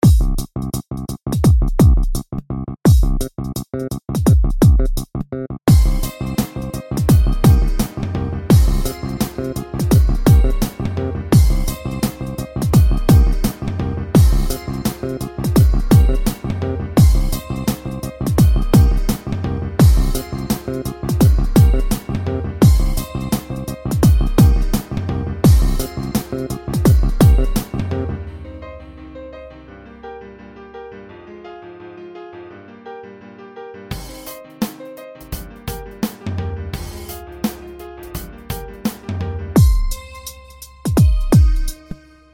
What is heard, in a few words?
dub